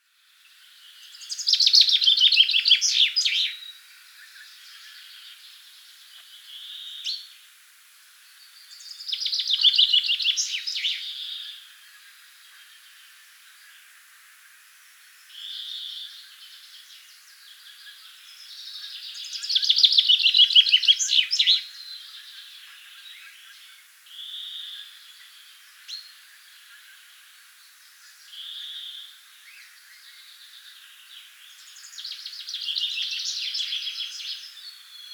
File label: bokfink fringilla coelebs